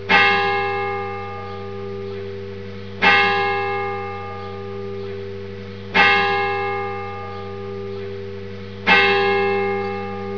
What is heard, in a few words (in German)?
Glocken; 999wavs